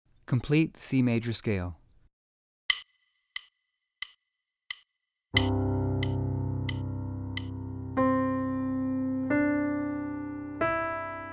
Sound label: scale